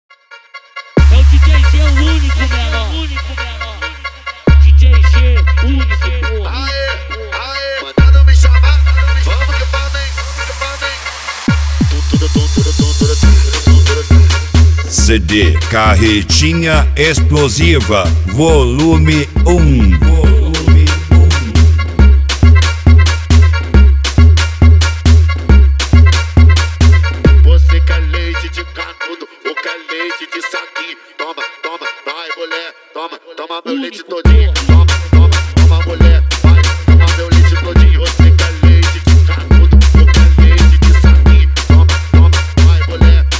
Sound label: mega bass